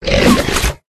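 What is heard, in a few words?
lizard attack bottom; yasher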